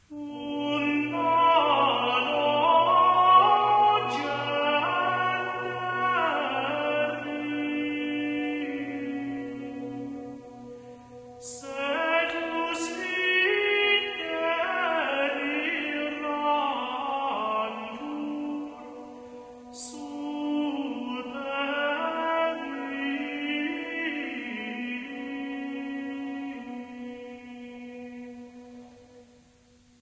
organum

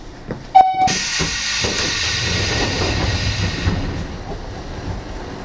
door buzzer, south door open

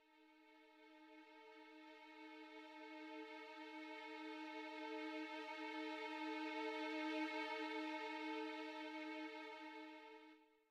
Music Cue

